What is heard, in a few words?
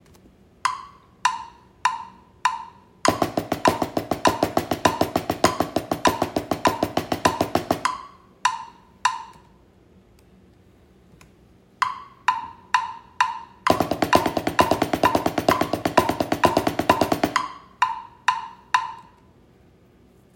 double stroke roll, drums